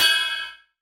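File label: ride, Hardcore Breakbeat, 159 F major; Big Fish Audio; Hardcore Breakbeat Action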